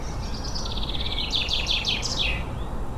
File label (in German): buchfink